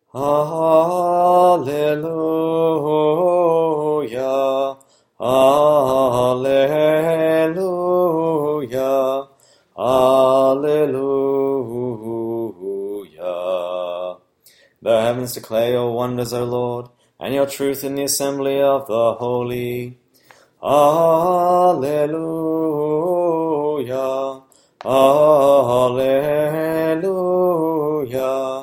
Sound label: Alleluia Tone 1; St Nicholas and the Apostles